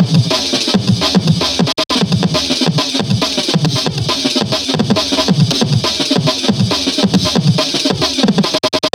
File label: Dr Loop break